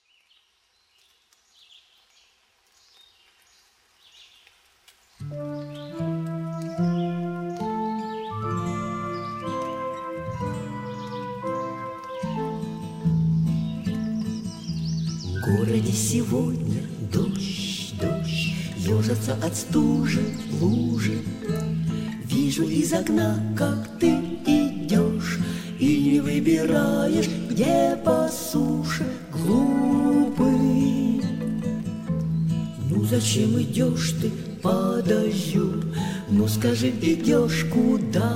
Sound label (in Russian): pesnya dozhd; logopedist